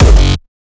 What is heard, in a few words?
kick oneshot lilmonster F#; GhostHack